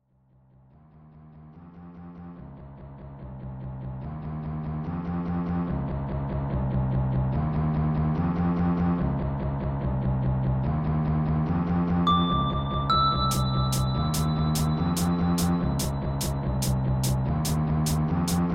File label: Organic Bells; Minimal